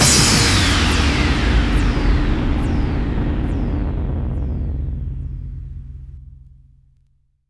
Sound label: Bassdrums FX, FX Kicks; Vengeance Samples